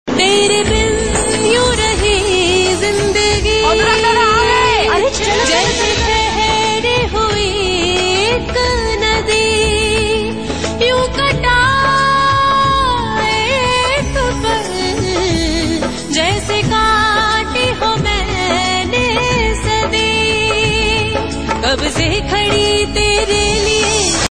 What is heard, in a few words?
Happy